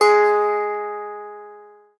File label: pick